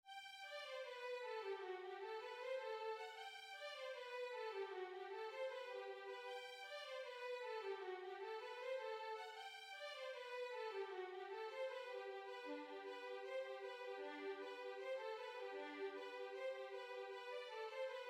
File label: Kincaldrum Melody, fiddle